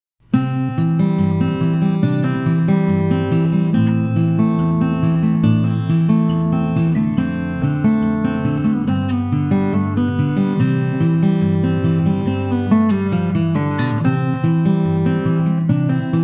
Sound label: Picking Mood